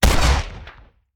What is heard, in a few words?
Rifle; Sound Ideas; Guns